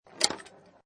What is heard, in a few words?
Pinball Sound Ball Rolls With Light Metal Impacts; Video Game; Video Games